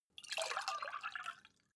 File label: glass pour fast; soundpool